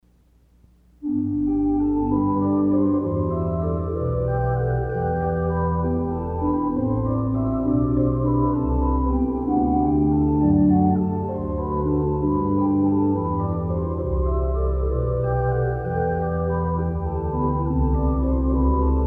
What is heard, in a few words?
Jesu Joy of Mans Desiring Solo Trumpet and Organ